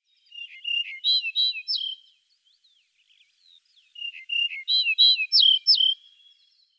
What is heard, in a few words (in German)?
Weidenammer Emberiza aureola; Vogelstimmen